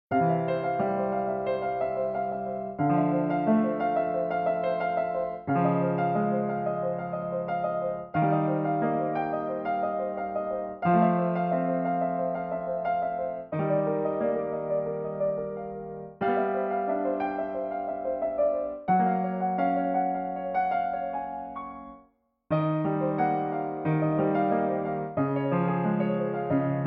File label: Piano